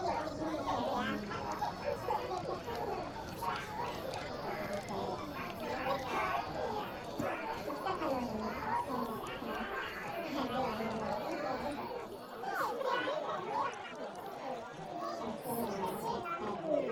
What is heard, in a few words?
tribal games, ambience